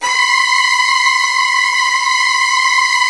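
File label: violin trills; Virtuoso Strings; ILIO; Kirk Hunter Virtuoso Strings VOL-1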